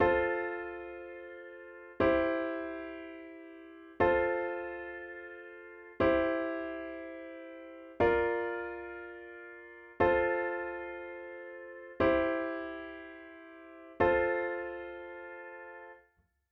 Ballad style progression in F inversions, piano

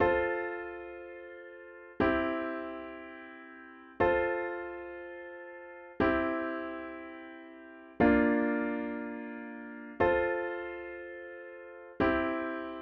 Ballad style in F root positions, piano

